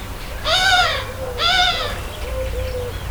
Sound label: 2 calls from a crow; soundscapes